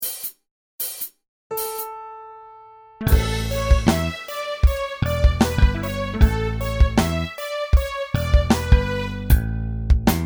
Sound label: Violin Rap Backup